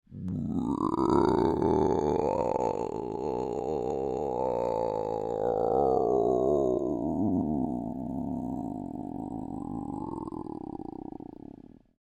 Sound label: Creature Groan Throat Rumble, Human; Groaning; Voice